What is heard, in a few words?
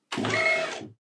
IBM Selectric electric typewriter carriage return